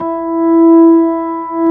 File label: ORG B3, B-3 Organs